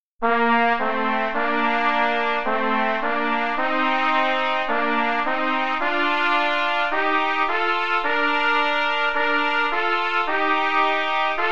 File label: Duets, qn=108, trumpet; Amsden Duets